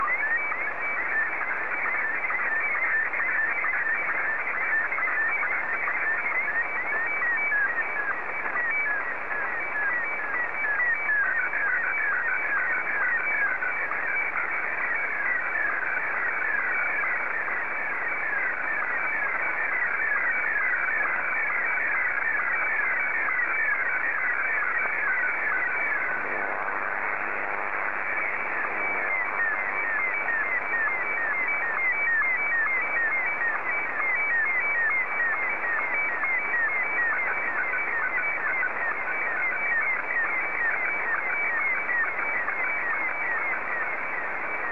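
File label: Martin 1, slow-scan television; JA3QJQ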